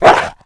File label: dog biting; animals